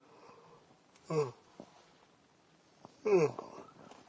yawn

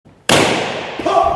pop

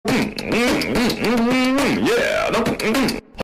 beatbox; by obama

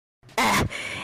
ugh

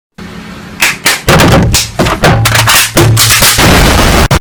the clap gunshot american version

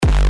dj cue button

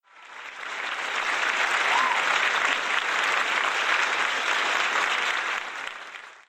clapping short

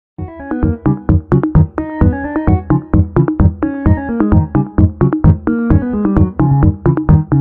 stick bug music

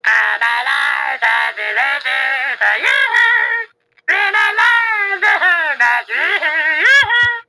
singing; the town with no name

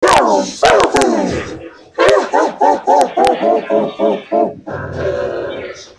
keep laughing

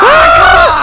pain

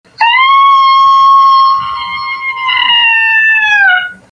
screaming

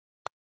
plopp

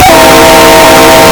chinese diesel locomotive horn

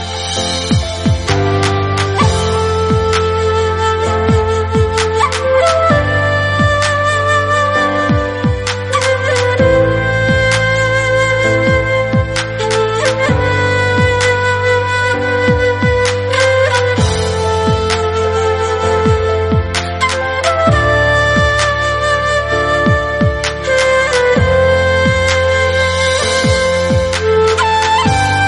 flute; pubg

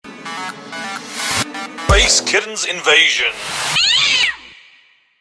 meeoow; kedi

